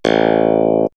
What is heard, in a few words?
Clavinet, keyboard; X-Static Goldmine; backtothe70's